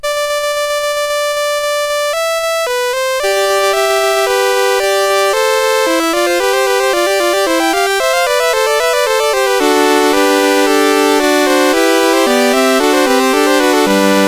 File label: Fugue, CSG8580R5; Fugue VIII 5